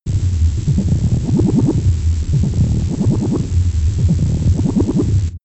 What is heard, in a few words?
space alien machinery pumping